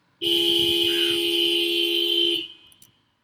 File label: Extended Blast